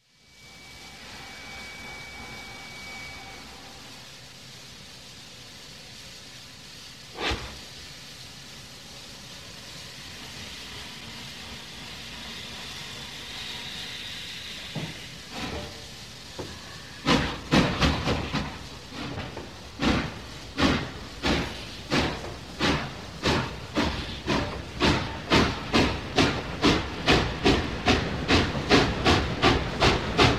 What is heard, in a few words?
Steam Locomotive; SOUND EFFECTS1